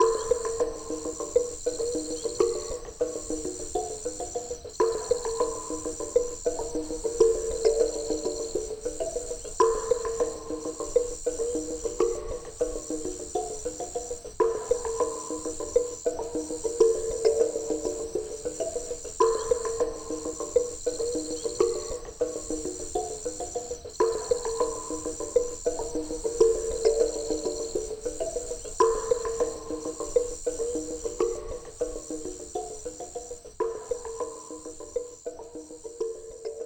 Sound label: Background Loop; Jackbox Games; The Jackbox Party Pack 1; LIE SWATTER